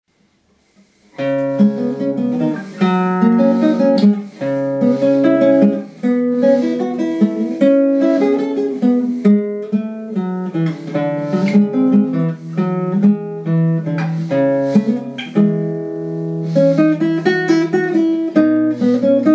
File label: Prelude, guitar; Manuel Ponce; nº10; Luciano Tavares